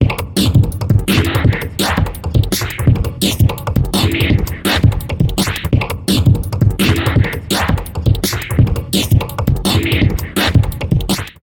Vocoder Drums Vocal; Zynaptiq Orange

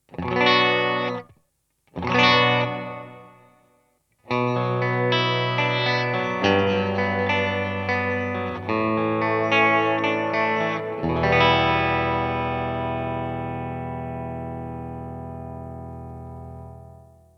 Room; Boss RV-200

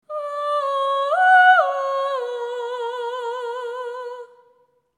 Female Vocal Original

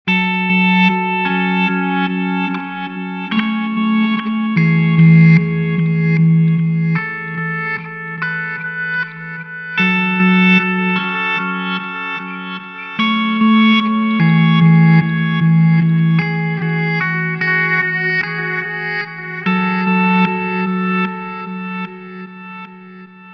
Boss ME-90 Natural Centa OD Reverse Delay

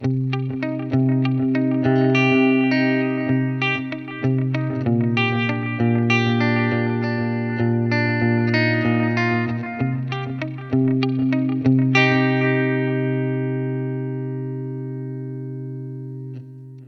Deluxe Reverb clean; Mooer Gluxe Reverb

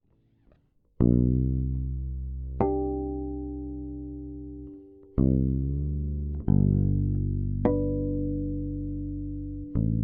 Radial J48 Fretless Bass

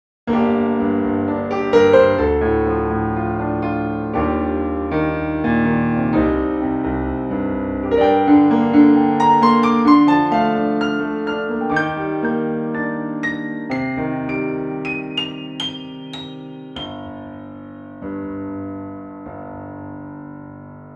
VSL 280VC Mikro Main C

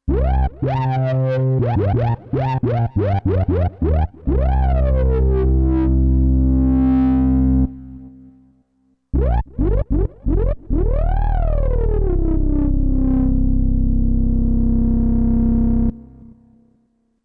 smack bass

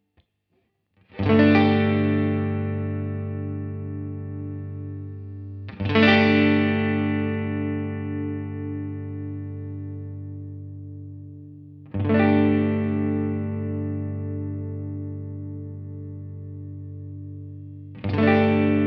Carl Martin Tone Tweaker Treble

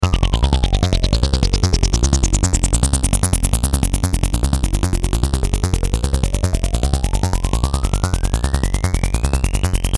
Bassloop Original

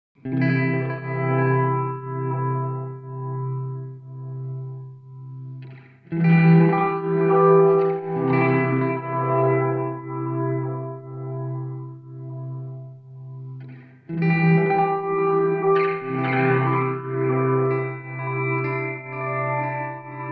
Duophony Y X LFO; GFI System

